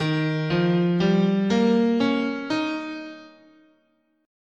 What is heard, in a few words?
D sharp Dominant Pentatonic, scale